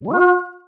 UI Zoom

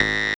incorrect code

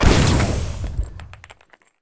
metal Eye Plate Explode

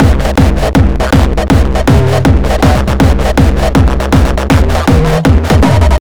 Beats Loop; Anger Foot

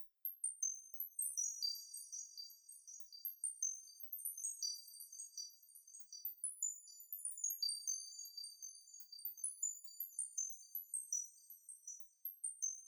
Score Loop; pac man hats 2 android ios mobile gamerip 2017

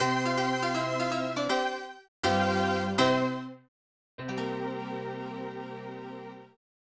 Lost Life, Game Over; Tinhead; snes

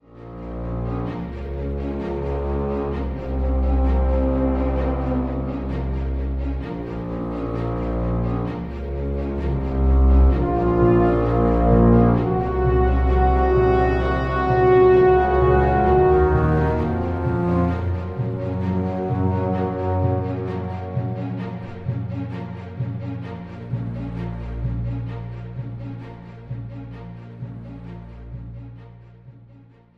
British Defeat; Screaming Steel

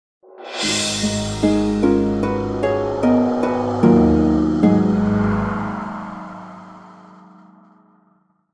Ui Lose; Dragon Spear

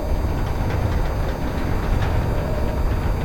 elevator moving loop

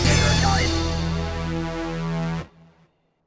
Game Over Gameover Confirm; Zibidi

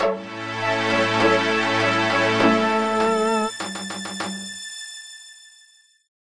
Smithing level up

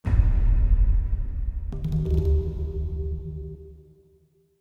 perc spot

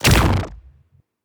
Weapon Shoot

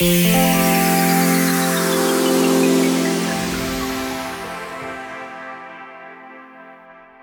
Retry Jingle; Friday Night Funkin Rifunk ost pc mod windows gamerip 2023

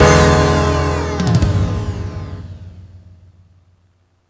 Lose; Pokemon Rumble Blast

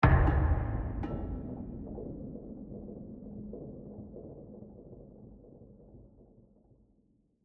Tension; Match Over

